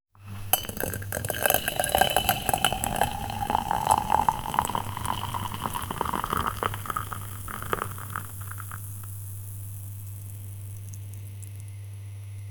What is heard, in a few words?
pouring hot coffee into a